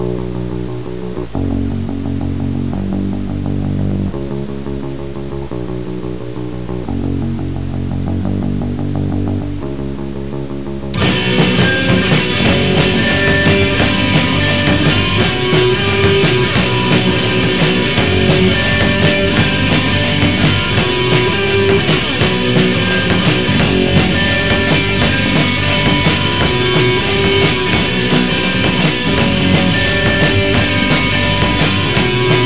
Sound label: punk